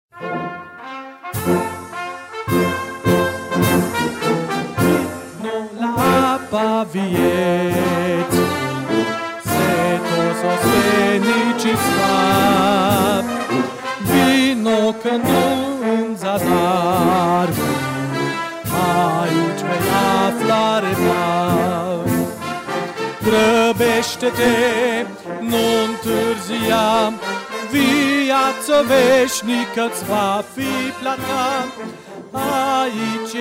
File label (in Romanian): Fanfara